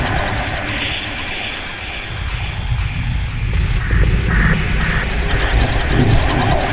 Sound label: haunted loop